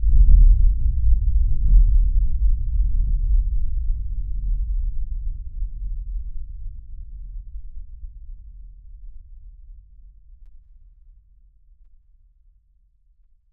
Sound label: HITS; 99Sounds; Cinematic Sound Effects; Generdyn